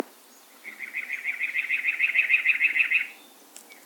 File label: Campylorhynchus brunneicapillus